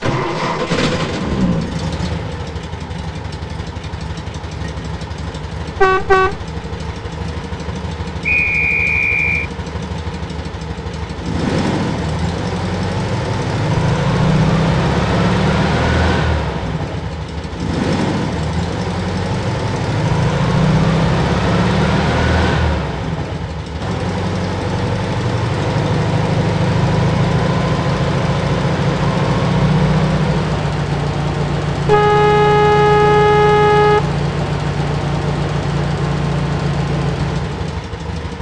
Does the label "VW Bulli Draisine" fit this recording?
Yes